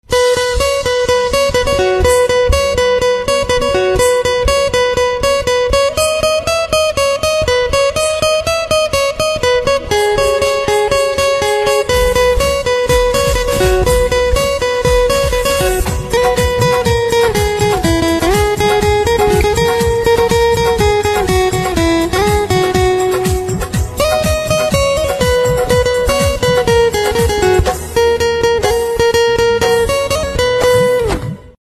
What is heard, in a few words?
Guitar Instrumental Ringtone